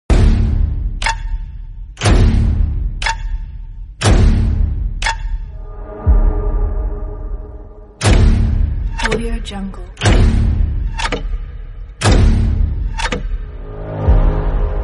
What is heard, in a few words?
mysterious clock piano sound